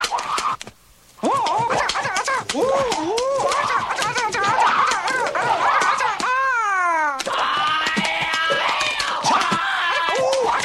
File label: yelling